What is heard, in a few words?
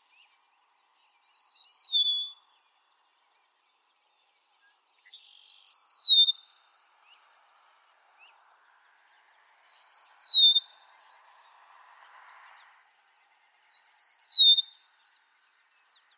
Meadowlark Sound